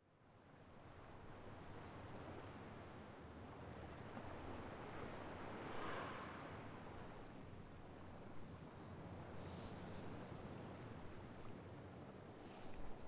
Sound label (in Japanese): 洗濯物をたたむ＆波の音